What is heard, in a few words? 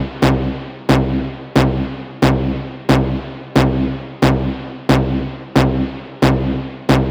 Synth Loop C 135, Techno; Ghosthack; AC2024 - Day 1 - Techno Sounds 2025